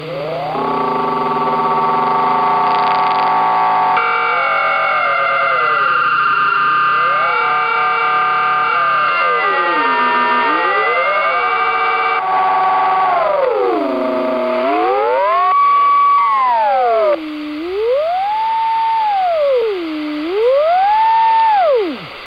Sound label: strange signals